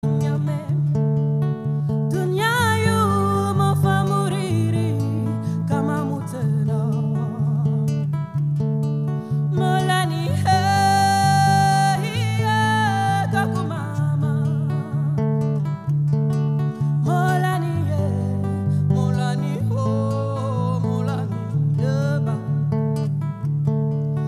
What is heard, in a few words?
Voice & Guitar